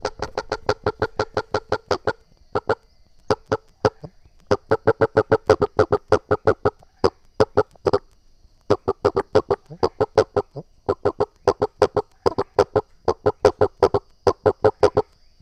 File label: Complaining Toad